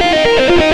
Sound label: xtra lead